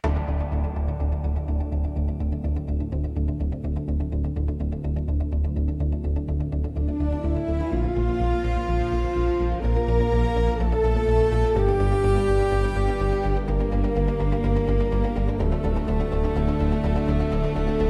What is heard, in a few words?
epic hybrid orchestra for cinematic